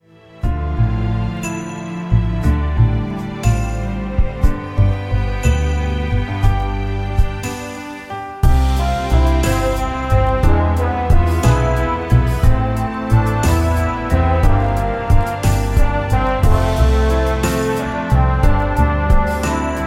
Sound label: Christmas Atmosphere